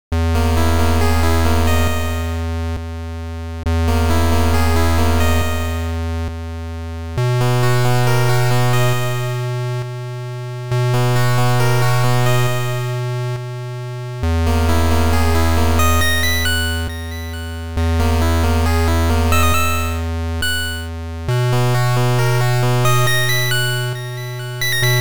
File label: 8bit